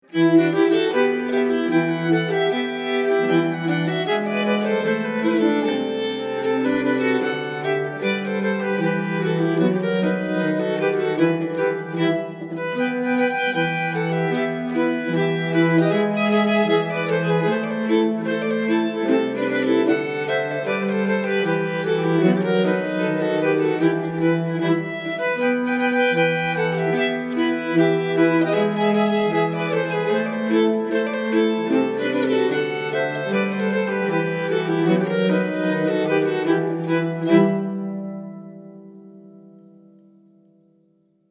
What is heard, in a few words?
Russisk Schottis, nyckelharpa